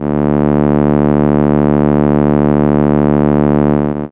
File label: Contra D2, ARP Solina, contra bass unmodulated; musicradar-synth-samples